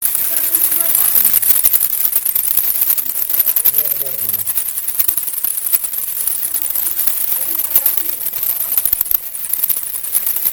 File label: bats